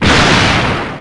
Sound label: super shock